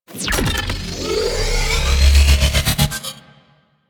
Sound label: Iron Blast Charge; Warframe; Warframes